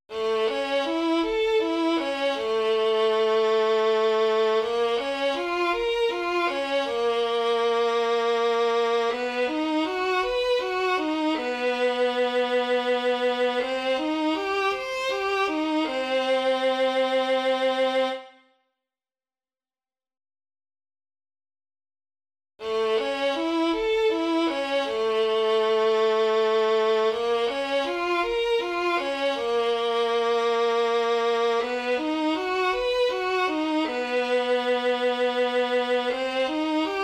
Arpeggios - Alternating Major-Minor, music warmups; silverchord